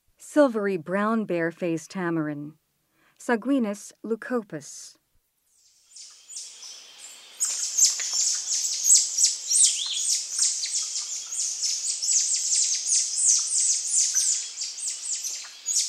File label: Silvery-brown bare-face tamarin; Primates of the Rainforest